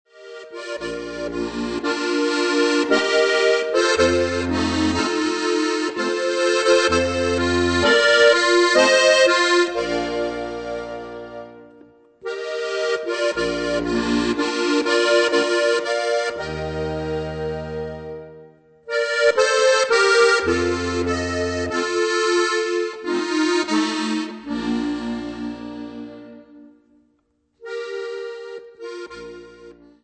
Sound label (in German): typisch goiserisch